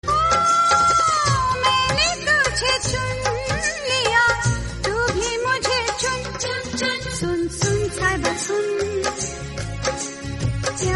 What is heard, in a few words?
romantic Hindi love songs ringtone